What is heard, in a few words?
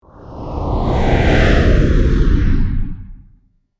cinematic whoosh; dragon studio